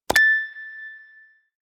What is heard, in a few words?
Menu button click